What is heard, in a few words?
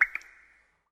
tear; Swamp Of Darkness